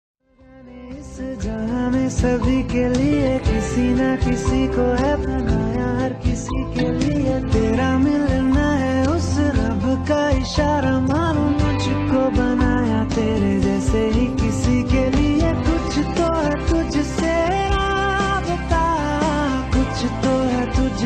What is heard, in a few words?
Bollywood Song Ringtone